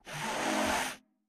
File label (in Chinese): 嗅闻声; 嗅探兽